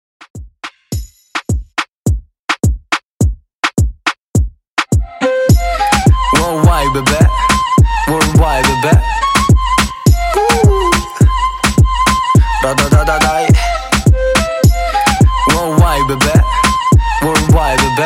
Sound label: Dance Afrobeat, 105 Bpm